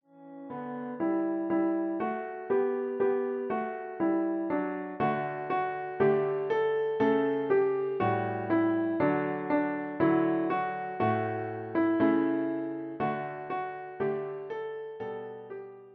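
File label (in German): Freude schöner Götterfunken, Klavier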